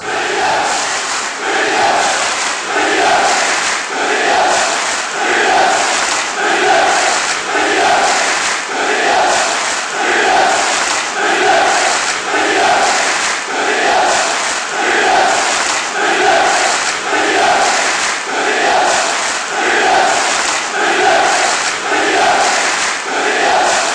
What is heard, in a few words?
fan chants; bif